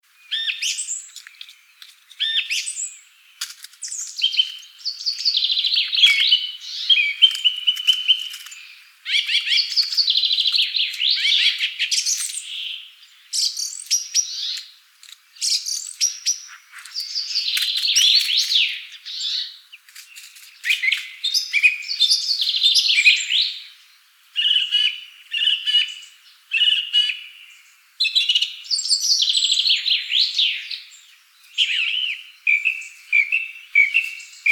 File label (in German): Singdrossel; Ornithologie